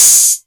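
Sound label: Hat Open, Novation Drumstation; DrumstationV1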